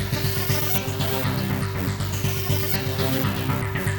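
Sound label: Sequence Pattern, Keyboards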